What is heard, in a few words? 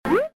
BOUNCED, Boing